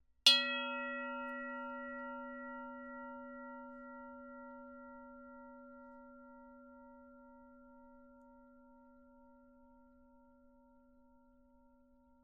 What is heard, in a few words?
low metal, aluphone